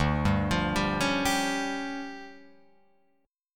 D-Minor Major 7th Double Flat 5th down Guitar Open F, DmM7bb5 chord; arpeggiated; JGuitar